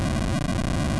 Fire; Zelda; AOL

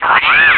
Spin Attack; spin-attack shouts; Link's spin-attack shouts

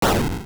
Master Stalfos Slash; Master Stalfos slashing with a sword

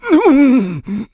Sumo Escaped

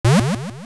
Mushroom Bounce; A rubber mushroom bouncing

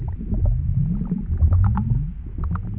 Swim; Morpha; OOT

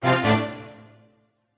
Battle Strike; Musical chords when Link strikes enemies in battle (10 samples

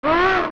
Hit; Moblin; zelda; OOT

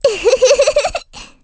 Happy Giggle; Agitha giggling, happy to meet a new bug (also heard when she first meets Link)

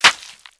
Splat

